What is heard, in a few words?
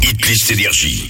Acap; NRJ